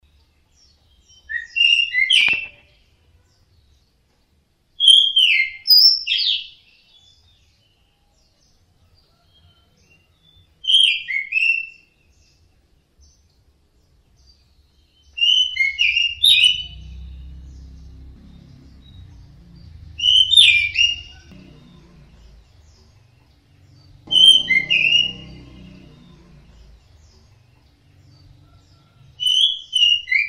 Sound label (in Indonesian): anis merah ngeplong